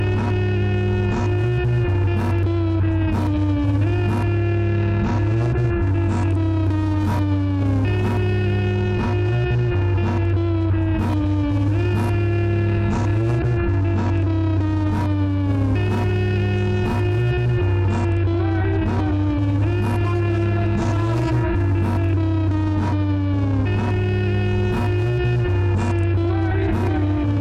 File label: microbass